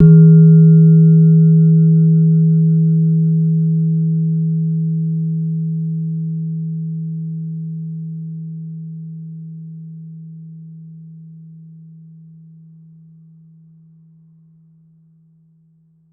Slenthem, Gamelan

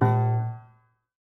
Prepared Piano

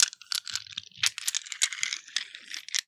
Marbles Rattle

